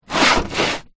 industrial tool box metal old medium slide movement on table